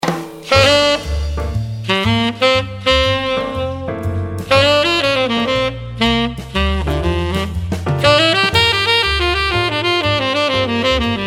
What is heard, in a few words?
Jazz Metal Tenor